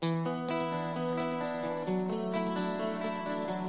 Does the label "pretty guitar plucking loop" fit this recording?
Yes